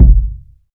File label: nice bass drum sound g sharp key; Duration 0:01; kick drum samples